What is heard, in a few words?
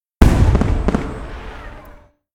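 firecracker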